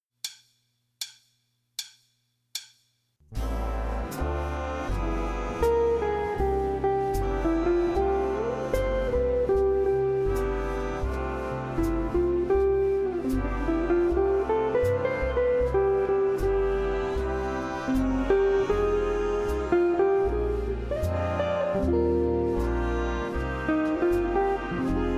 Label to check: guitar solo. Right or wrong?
Right